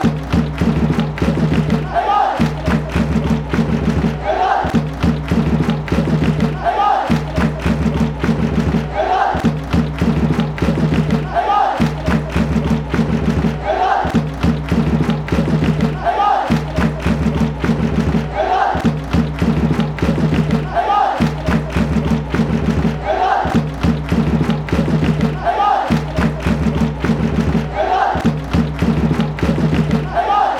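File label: fanchants, football chants; eibar eibar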